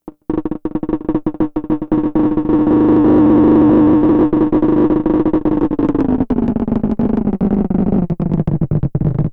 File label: FX, Drum Machines; Fricke Schlagzwerg